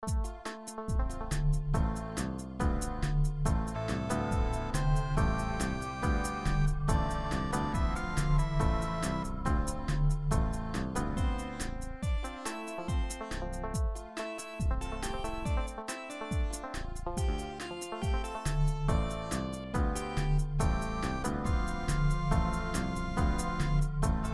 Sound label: jazz; animal